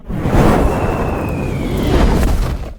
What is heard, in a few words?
building falling; UnityTowerDefense; buildings general